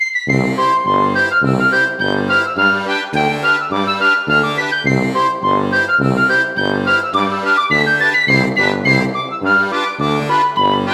reveal music, Pirate

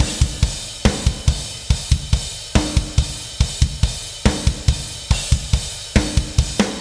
Drums and Jingles Explore - Drums; Metal Tales Fury of the Guitar Gods

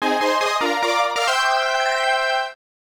Escape Success; Emeraldia; arcade

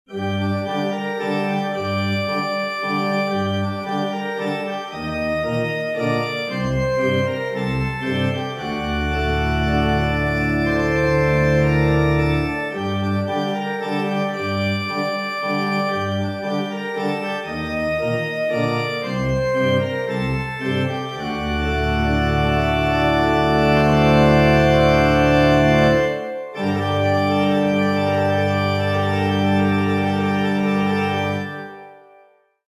jingle main theme; Haunted

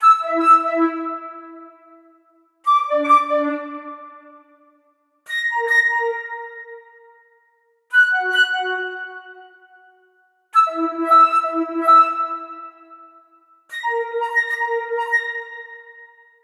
Cave Hi Pic Fl; Torchlight III; PS4

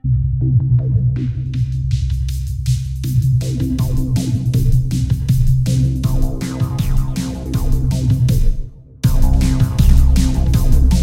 car race loop; Hyper Drive The Insane Gravity Race

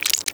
squish, stuffed animals; Birthday Party Bash; Wii; Spanish

